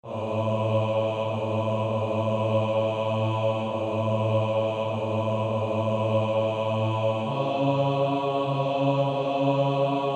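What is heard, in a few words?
Male Choir, bass 2; Silent Night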